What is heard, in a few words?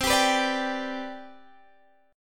C Major 7th Suspended 2nd C, chord